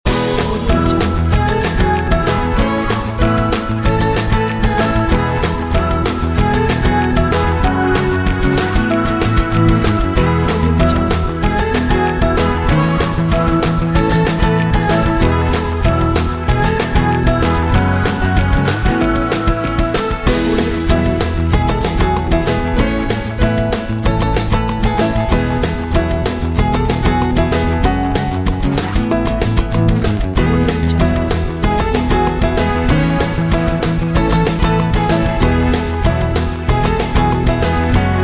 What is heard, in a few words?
happy acoustic loop